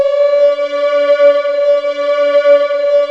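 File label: SOLO VOICE; FMSTORY#2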